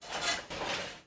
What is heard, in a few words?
machine walk; megaglest